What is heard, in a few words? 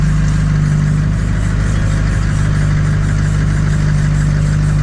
bad u joint